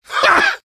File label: laugh short; vo; heavy